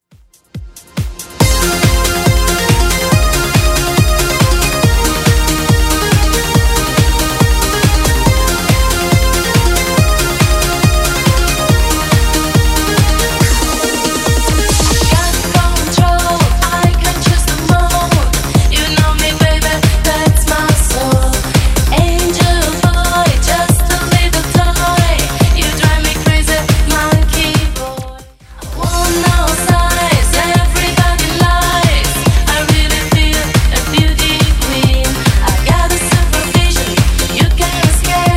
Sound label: 80s Redrum 140bpm